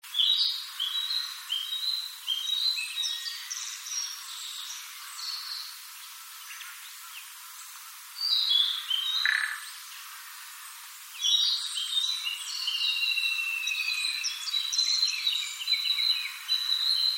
kibita

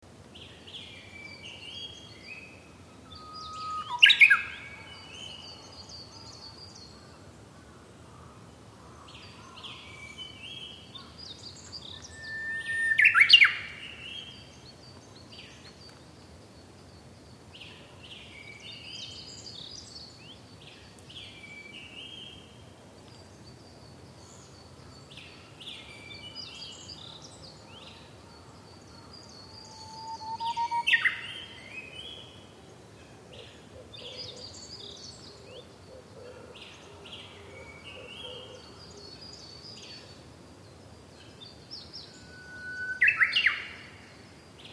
uguis

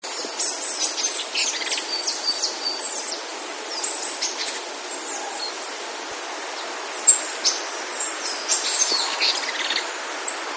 kibita

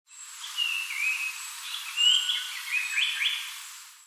sanko

